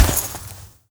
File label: warrior walk carpet